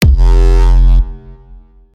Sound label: Growl 125 Bpm